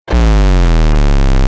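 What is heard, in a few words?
extreme bass drop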